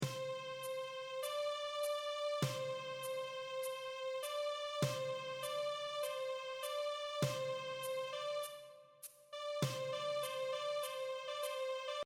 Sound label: dotted rhythms, violin